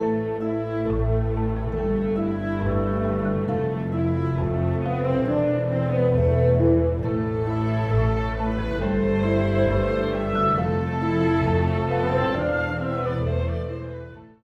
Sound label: cricket lullaby, orchestra